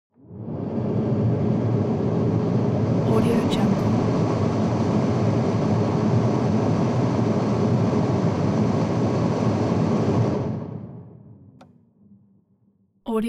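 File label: transportation sports car interior ventilation hard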